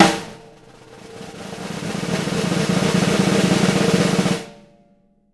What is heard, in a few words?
snare roll, JZ XSNARES; Sampleheads; Peter Erskine Living Drums